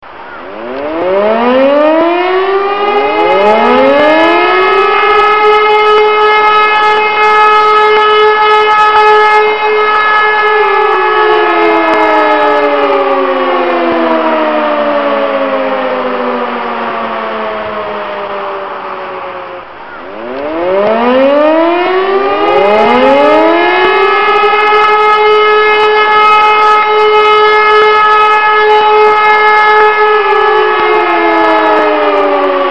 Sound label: Sounds Alarm